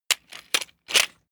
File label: plastic sundries handling